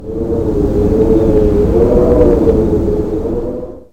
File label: whistling wind